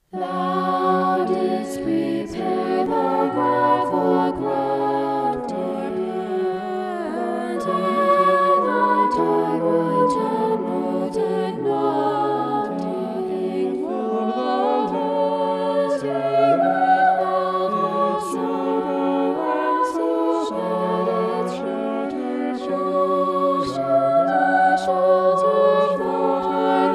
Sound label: vocal poly; psalm 80